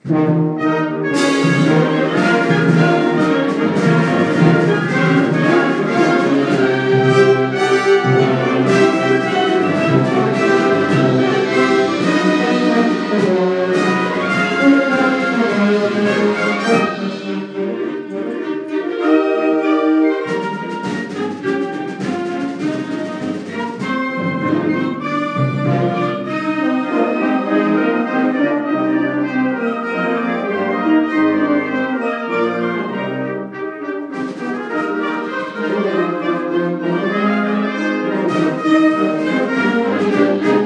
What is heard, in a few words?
Festive Fanfare for the Holidays